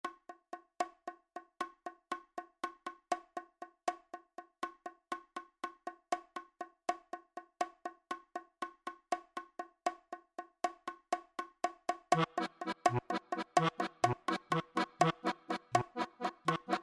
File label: Solo Accordeon; Sakura